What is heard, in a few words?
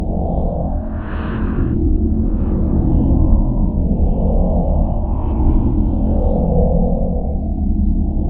Loop Wave; KriptoFX; Realistic Effects Pack v1; PARTICLES